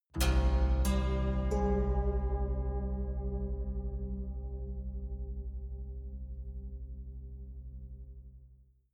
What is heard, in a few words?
haunted strings dark omen soft